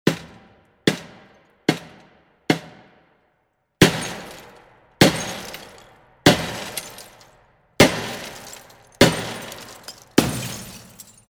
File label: glass breaking